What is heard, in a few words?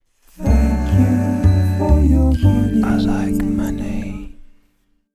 Goofy Donation